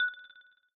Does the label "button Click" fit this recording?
Yes